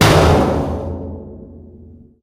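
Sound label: panels impact large, metal; Portal 2; Source Engine; video games; physics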